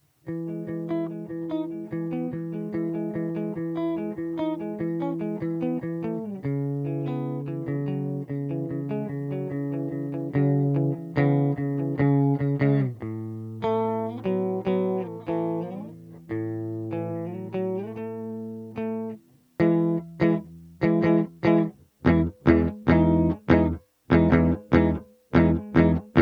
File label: guitar; viper